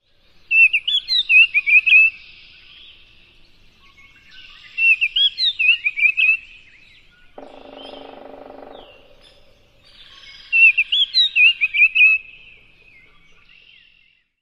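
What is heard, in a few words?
songs number 2, oriole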